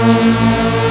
Synths A#; Vengeance Samples

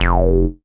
Synth Bass; Vengeance Samples; VEE Synth Shots

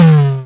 Minimal Perc; Minimal Percussion

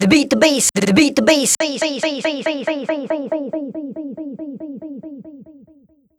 Vocals; Vengeance Samples; Special Sounds

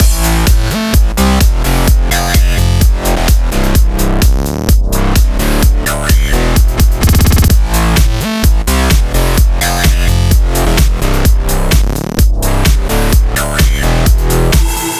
128BPM Mix; Vengeance Samples; Query Kit

